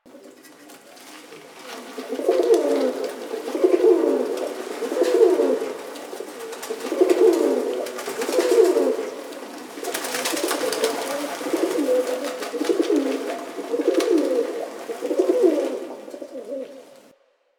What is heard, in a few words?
Exterior Pigeons Loop; Warframe; Ambience; Nineteen Ninety Nine; DE